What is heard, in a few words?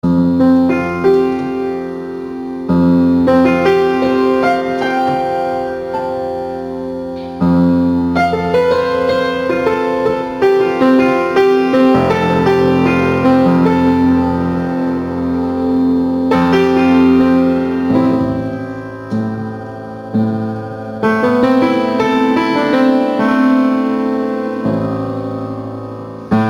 Piano Impro